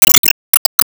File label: Enemy Bigclam Cell Close